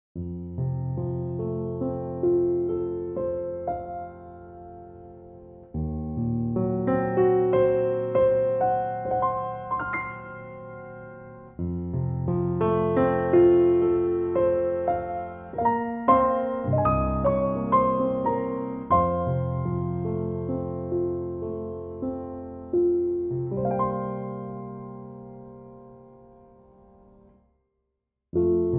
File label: F piano